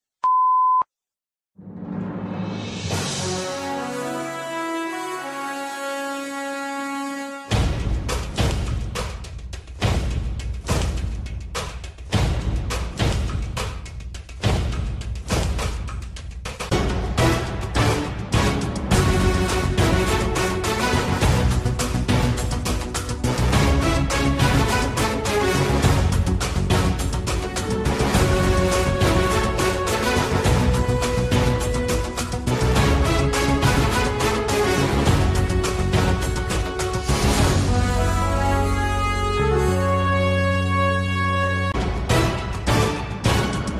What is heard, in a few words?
floor music gymnastics; larisa iordache